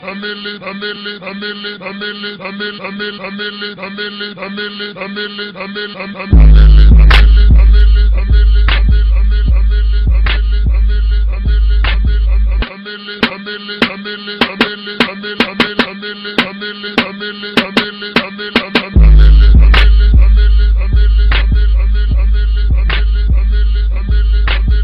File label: Bass Test